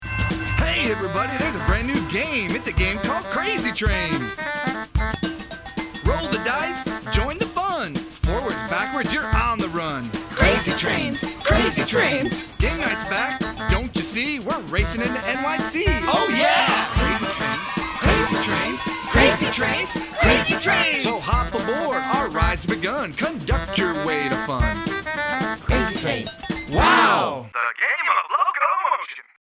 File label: song